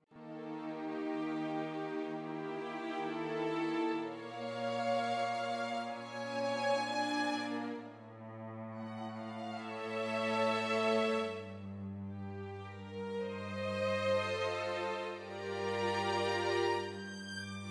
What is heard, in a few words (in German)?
Winter Quartett